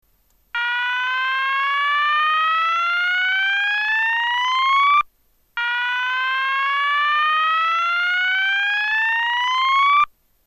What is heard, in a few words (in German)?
ton, Signalgeraete